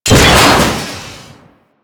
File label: Storage Container Cylinder Smash; Objects; Warframe